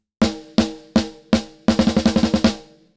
snare Punch and Clarity; AMEK 9099

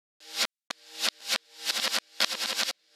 HP 2kHz; WET 0.00dB

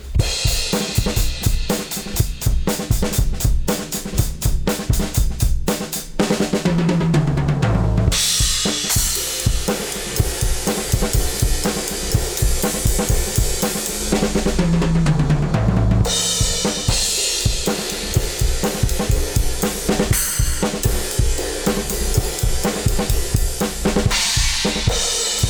drums

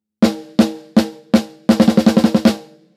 LOW High pass 36dB oct; Kelvin

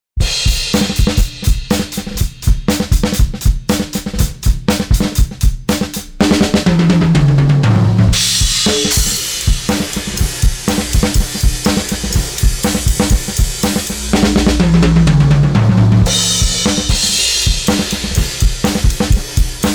EQ 250 Bell boost; Kirchhoff

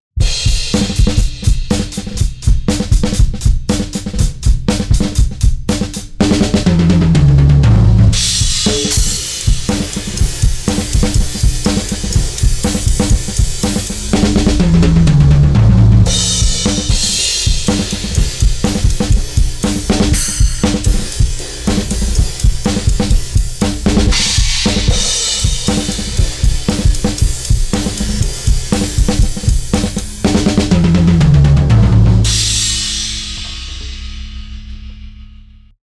Drum Bus; RootOne

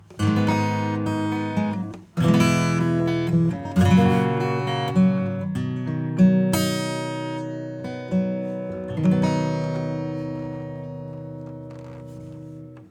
acoustic

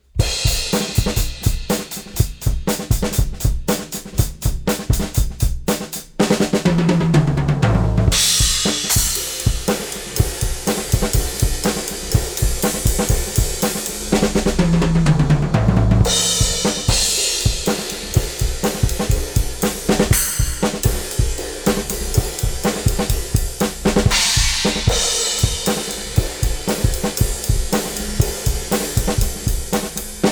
drums

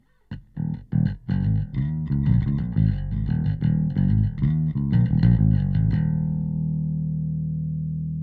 Synth Bass

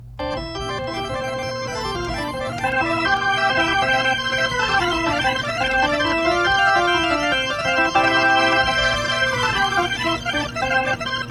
organ; Classic Crystals

